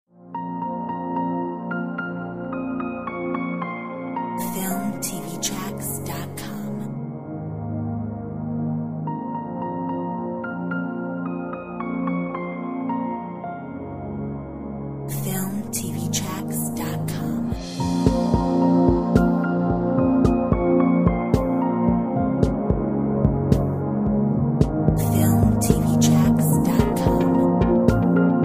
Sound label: Early morning